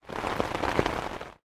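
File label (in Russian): прогулка под зонтом во время дождя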